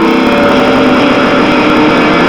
FX GTR, GUITARS KIT

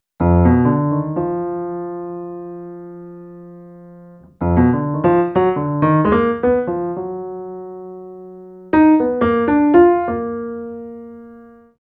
Piano Solo, Cinematic Funk; Sony Creative Software; Urban Tone Poems; Master Zorn

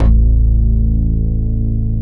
PUNCH BASS; BASS SOUNDS

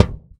Pound, Kicks; Airwindows

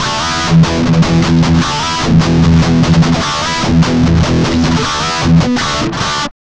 Guitar Licks

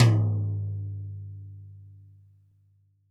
TD-20 Tom; Toms

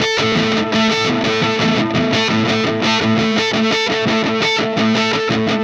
Static FX Guitar

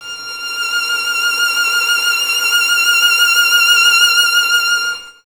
violin, Solo Instruments

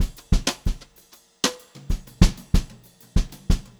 Brushed Drum Loops jazzy 126

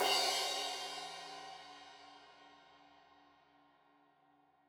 Crash 15in L edge, Cymbals Stereo

